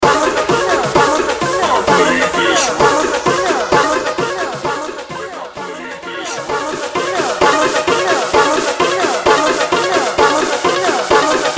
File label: Latinos Weke, Bootleg Mix